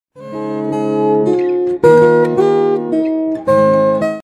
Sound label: Guitar Ringtone; ZTE